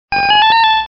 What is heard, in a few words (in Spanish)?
Grito; Porygon2